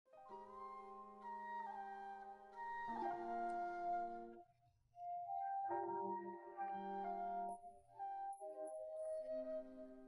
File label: accompaniment